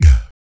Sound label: VOC PERC, Vocal Planet Jazz FX BASS PERC